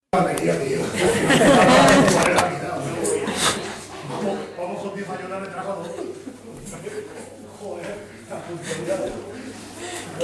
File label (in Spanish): Risas